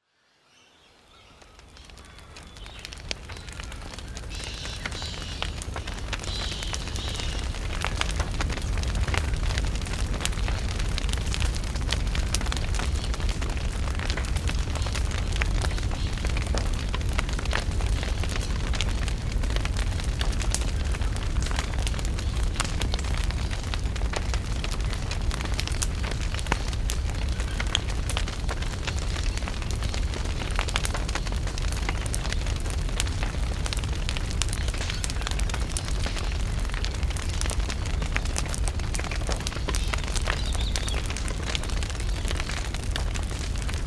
Ambient

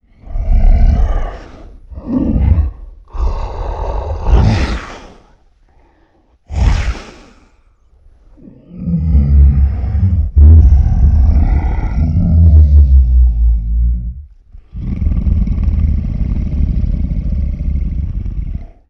Snarling & Breathing, Vocals - Monster; Sony Pictures Sound Effects Series

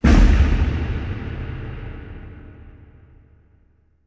Slow Hit; Title Sequence Effects; Sony Pictures Sound Effects Series

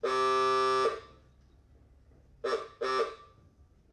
Ford Model T Horn; Sony Pictures Sound Effects Series; Period Vehicles

